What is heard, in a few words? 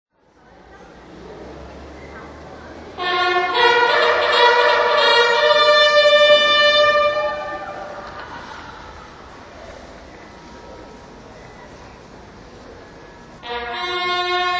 fanfare; meningate